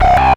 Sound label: BLURP LOW, Hard & Loud Techno; USB Soundscan vol.01; AKAI